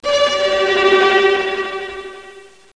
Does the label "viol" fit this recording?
Yes